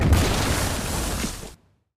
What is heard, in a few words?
robot steam explode; steam camp